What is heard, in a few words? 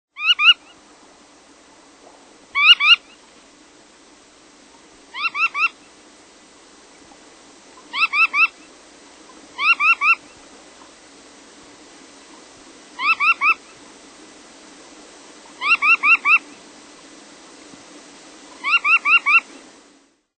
Surfbird; Birds